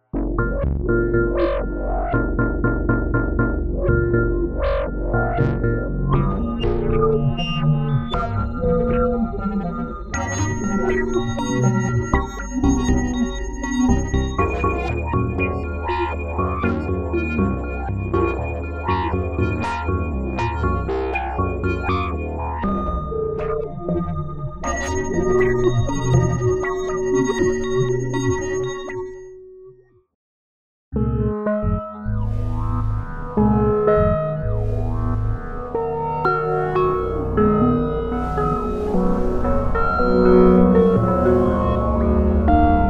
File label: synth